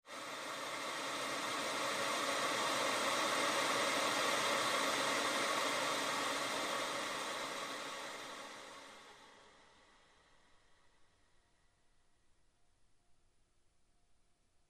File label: metronome stretch